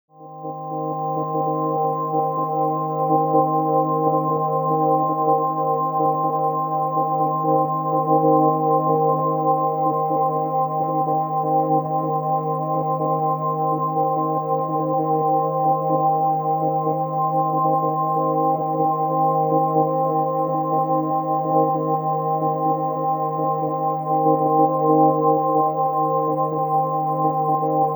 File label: stuttery synth